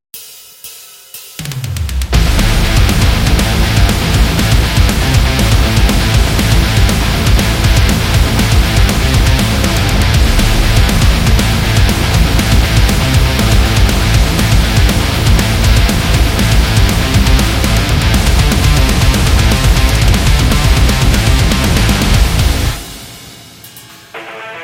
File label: Tabata Metal